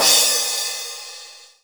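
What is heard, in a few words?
cymbal, drums; Best Service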